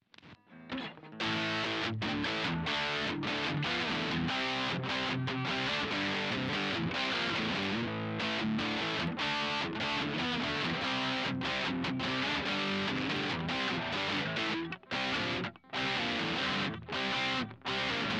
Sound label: rock